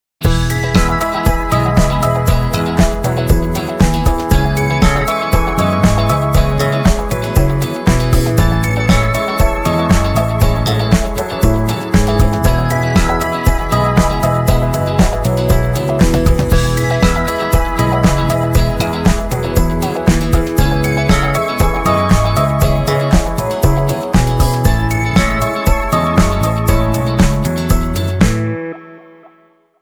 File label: instrumental